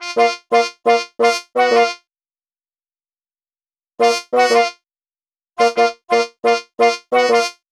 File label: Horns